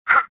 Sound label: jump; swamp